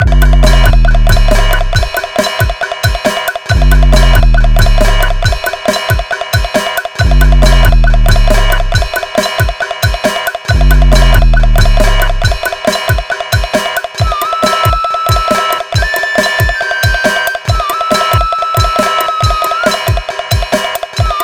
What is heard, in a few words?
Ethno